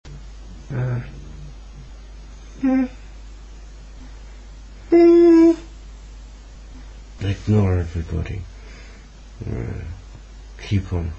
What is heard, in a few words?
keep On Humming